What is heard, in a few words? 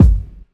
fat kick drum one shot g sharp key